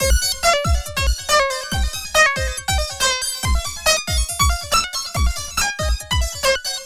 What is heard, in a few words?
Distorted Loop, Drums & Percussion, Electronic